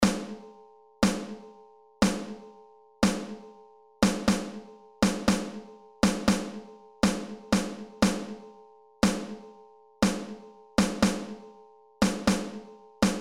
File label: Rhythms Snare Drum